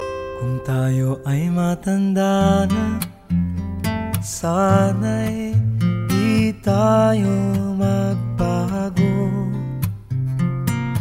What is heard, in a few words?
cute love